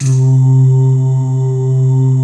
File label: DOO PADS; Pro-Rec - Synthsonic